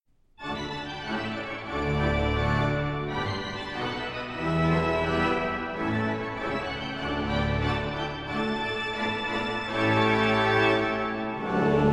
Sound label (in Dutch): Psalm 150 Looft nu de Heer, mannenkoor